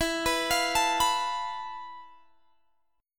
F Major 7th Flat 5th E, guitar chord

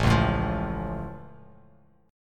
A Augmented A down Bass Standard; strummed; JGuitar

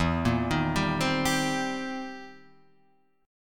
C Minor 7th E; Cm7/E arpeggiated

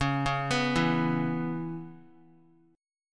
C sharp Minor 7th, Guitar; arpeggiated; C#m7; x,4,1,4,4,x

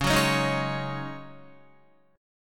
Db Suspended 2nd Suspended 4th Db Guitar

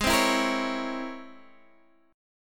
C sharp Minor Major 13th G sharp, guitar chord

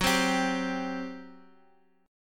G Major 7th Suspended 4th, Gb

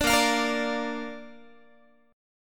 A sharp Major, Guitar; strummed; tuning 4A,4E,5A,5D,5G#,6C#

